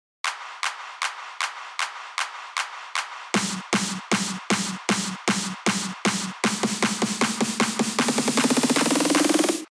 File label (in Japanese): クラップとスネアで4つ打ちを明示している例です。; EDM